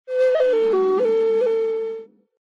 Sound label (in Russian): klassicheskij zvuk flejti